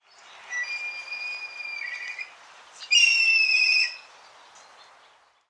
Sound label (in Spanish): Milano negro Milvus migrans; minato negro